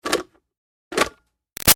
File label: Switch Plastic Bubble Machine On-Off Hard; Switches (Sound Effects:Objects); Big Room Sound